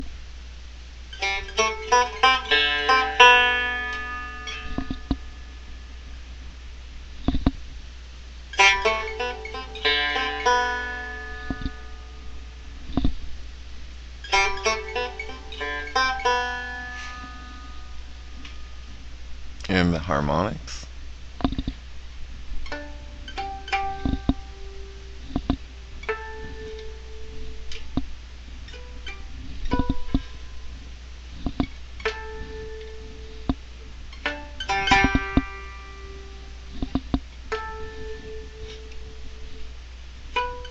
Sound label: banjo